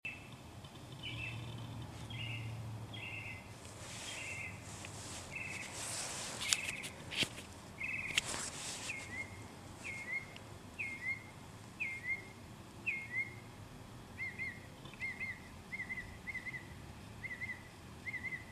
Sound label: birdsong